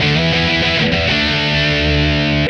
E4 95 chords

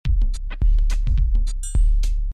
EZ Growler 106

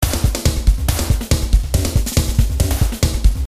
Crankin 140

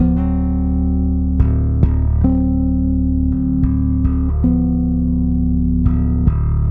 Bass Chords D 108

